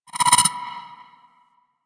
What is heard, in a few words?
Library Scanner Zoom Out, UI; Warframe